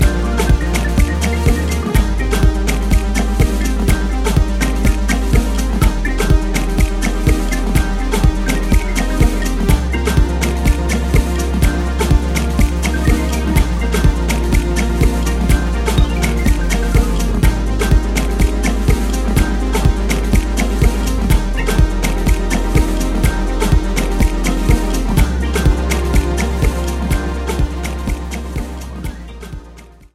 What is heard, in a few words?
INST ALT